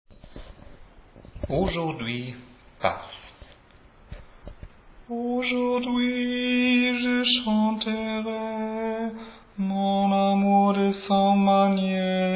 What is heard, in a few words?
Bass